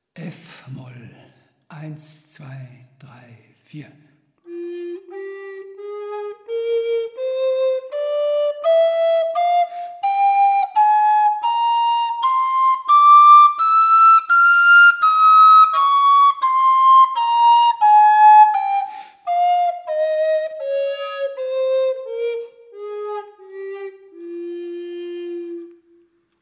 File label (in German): Altfloeten tonleitern